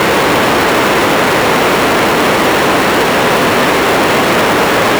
wave random phase per cycle, 400Hz＋8倍音の2～8倍音の位相をランダムにずらした音（もはやホワイトノイズ）